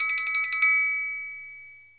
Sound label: Bell Roll; FREE SFX PACK VOL 2